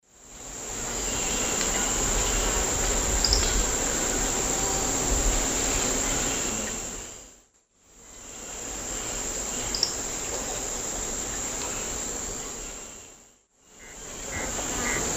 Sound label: Knipolegus poecilocercus, display song male; S. America; Eastern Lowlands